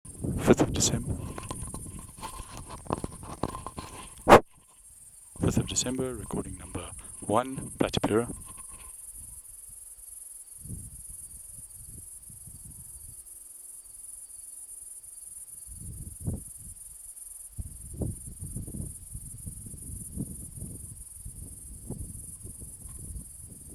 Platypleura sp Chongoni Forest

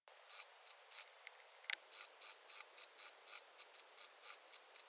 Eumodicogryllus bordigallensis

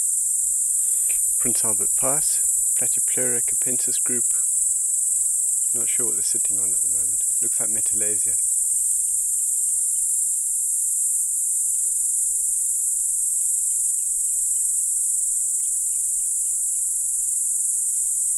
P.capensis Prince Alberts Pass

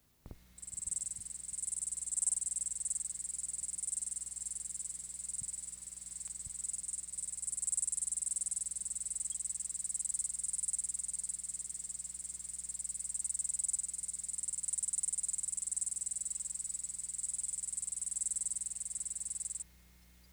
Diceroprocta vitripennis

